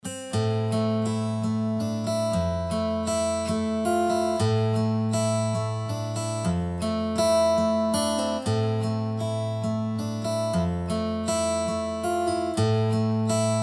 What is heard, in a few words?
Kontakt7 Acoustic Guitar